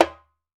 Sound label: WALK DRUM, African Drums